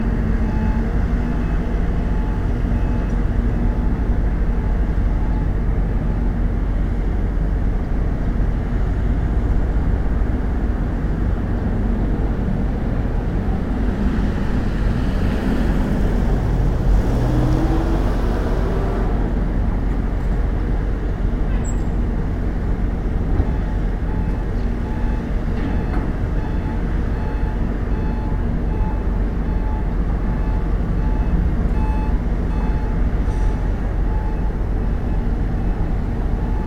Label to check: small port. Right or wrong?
Right